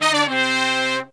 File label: Pop & Funk Brass, 120